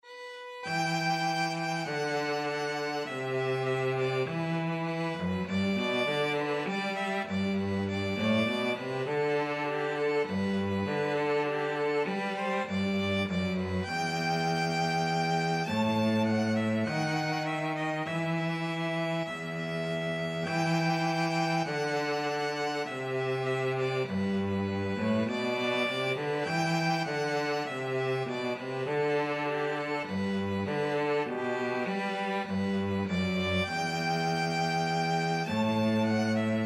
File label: johnny has gone for a soldier, violin-cello duet